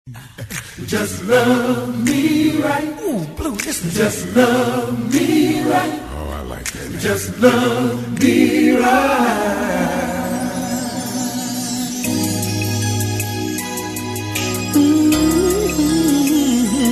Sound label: men cry too